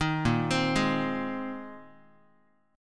A sharp 7th Suspended 2nd Suspended 4th, down, Guitar; arpeggiated; chord; JGuitar